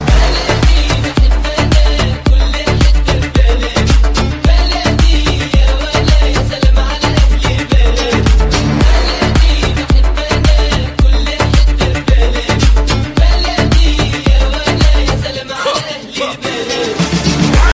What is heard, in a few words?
Arabia